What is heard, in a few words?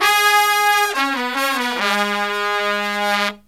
Killer Brass Riffs, HI; USB Soundscan vol.29 - Killer Brass Riffs; AKAI